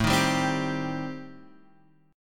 A Minor 7th Flat 5th A; Am7b5 strummed; x,0,1,0,1,3

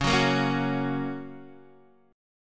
A sharp Minor Sharp 5th

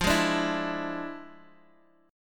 E 7th F, guitar; E7/F strummed; JGuitar

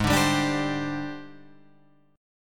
Ab Major 7th Flat 5th Ab, down, Guitar, Drop D

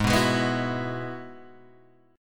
Ab Minor 7th Sharp 5th Ab, down, Guitar, Open G; strummed; 4D,4G,5D,5G,5B,6D; JGuitar

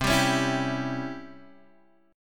Db Minor Major 7th Flat 5th C

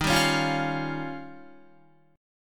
D sharp 9th, Guitar; strummed; 4F,4A,5C,5G,5C,6E; 10,10,13,10,13,13; JGuitar

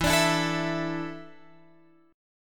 E 7th Suspended 4th, Guitar, Open F; E7sus4; strummed

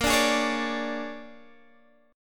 A sharp 7th Sharp 9th A sharp down Guitar Open D

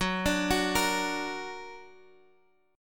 F sharp Augmented F sharp

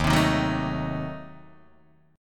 Eb Diminished 7th Eb, Guitar Open D

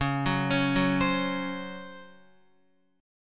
Db Major 7th Suspended 4th, Guitar; arpeggiated; JGuitar

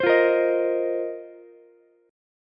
Bb Suspended 2nd Suspended 4th Bb down Ukulele; strummed

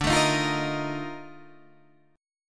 Bb Major 11th D

